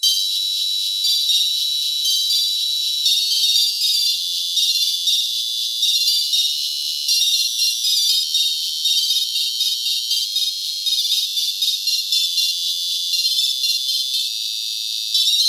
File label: Simulation Of Tinny Electronic Novelty Toy Music